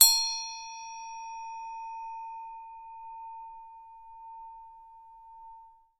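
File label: Buddhist sounds melody single ringing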